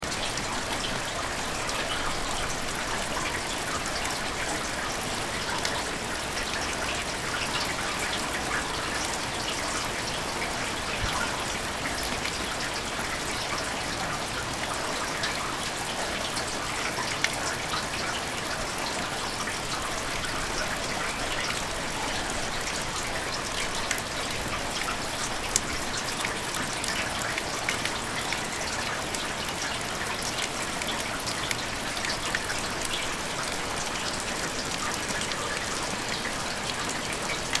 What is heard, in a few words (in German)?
Regen